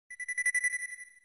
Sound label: sms; KU990i Viewty